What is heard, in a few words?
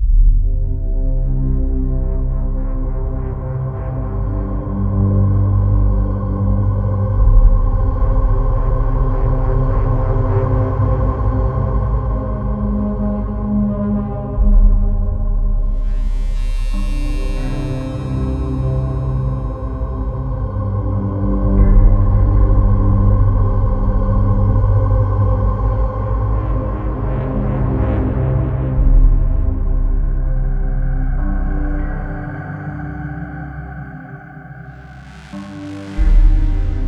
darkness music; Five Nights At Akers; fnaf